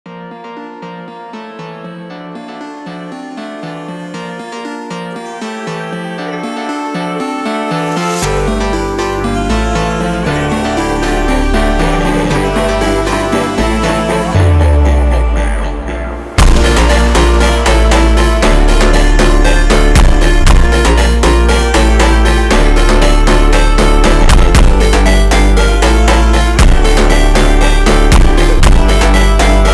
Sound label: SLOWED REVERB